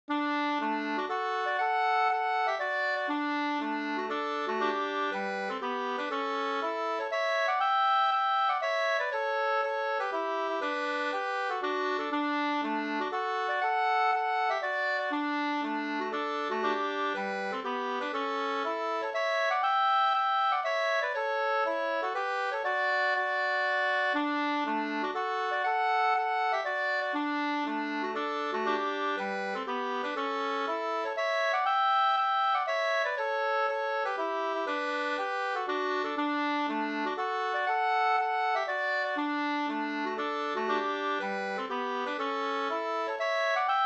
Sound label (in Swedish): polska Bodapolska D dur polskan